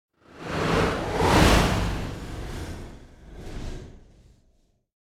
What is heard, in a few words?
Whoosh Sci-Fi Wind; Hollywood Edge; sound designer's toolkit v2